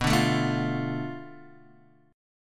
B Minor 7th Flat 5th B Guitar DADGAD

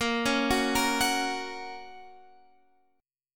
A sharp Minor Sharp 5th, Guitar Drop D

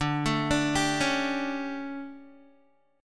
D Major 7th Suspended 4th, Guitar; DM7sus4 arpeggiated

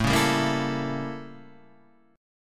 A 7th Flat 9th; A7b9 Chord {5 4 5 3 5 5} strummed

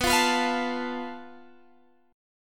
A Suspended 2nd Sharp 5th

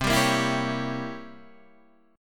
C 7th Flat 9th C; C7b9 strummed; JGuitar

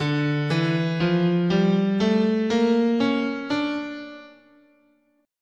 D Harmonic Minor, guitar, scale

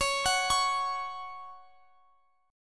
Gb 5th Db, guitar

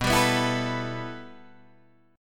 B 7th Suspended 4th Sharp 5th, Guitar, DADGAD

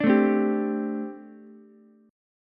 G Suspended 2nd Suspended 4th, Ukulele; Gsus2sus4 strummed; JGuitar

